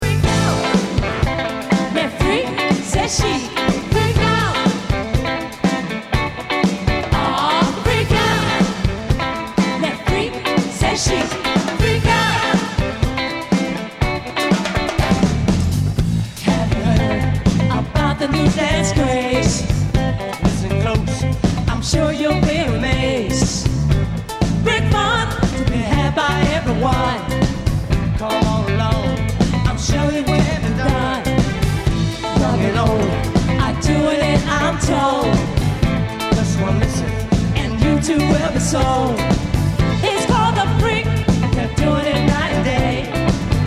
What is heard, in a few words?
disco freakout